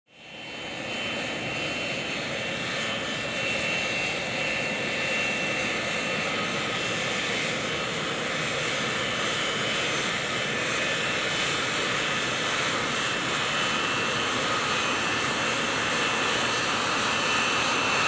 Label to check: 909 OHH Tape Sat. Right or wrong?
Wrong